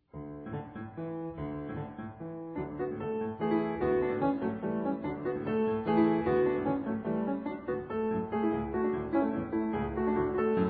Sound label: Springar Piano; Jon Vestafes; grieg